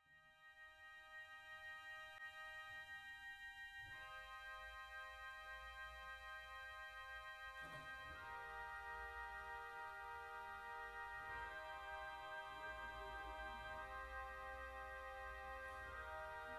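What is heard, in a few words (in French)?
Voix coeleste 8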